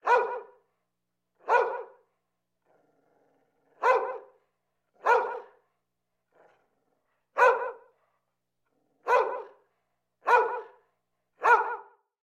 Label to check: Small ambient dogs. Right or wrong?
Right